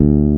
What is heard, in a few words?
BASS D2; AKAI S-Series CD-ROM Sound Library VOL-8